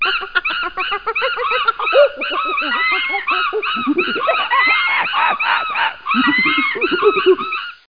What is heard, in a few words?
Monkey; T.J. Finds a Friend